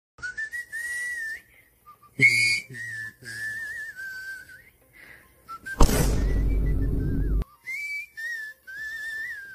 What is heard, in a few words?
whistle